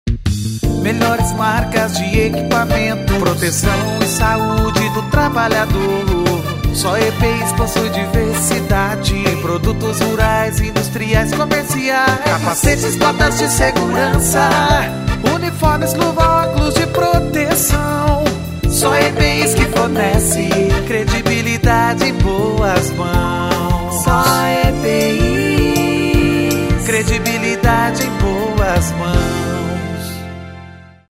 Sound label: jingle; epis master